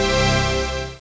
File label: High Score Super Bonus; Blox Arcade 1.0.2